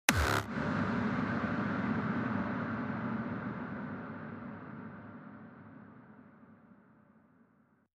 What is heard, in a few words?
Distorted Wave